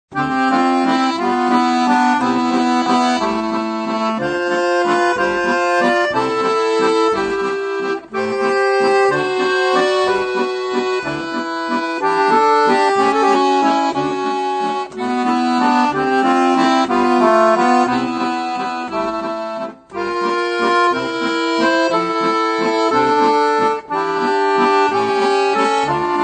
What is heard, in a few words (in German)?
karussell jazz kadenz